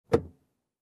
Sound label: Opening compartment